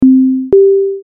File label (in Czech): D A xylofon; Cerne klavesy